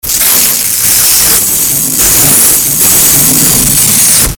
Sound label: distorted scraping noises